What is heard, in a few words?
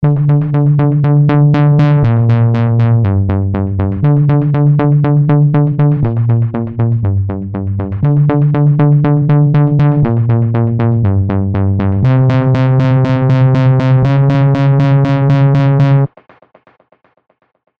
Bass RHY Macro Modulation; Heavyocity Mosaic